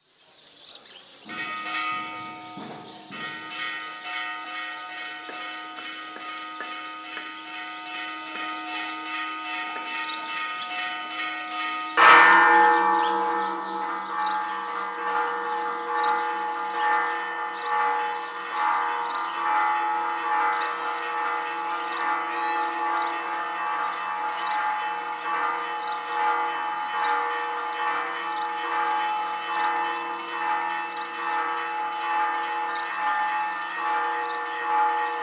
Church bells, Tarata, various different bells, also birdsong and distant car horns and traffic; Bolivia; Church Sound Effects; BBC